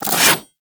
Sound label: Melee Whoosh; Warframe; Corpus; Arachnoid Hunger; Abilities